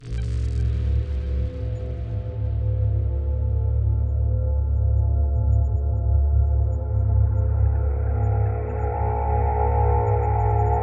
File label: Ambience New Right; Five Nights at Candy's